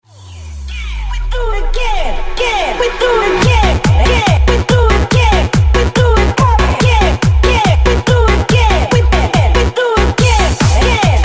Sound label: BASS Funky; Funky Tunes Vol. 2